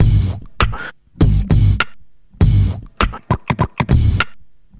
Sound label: Beatbox, Drum loops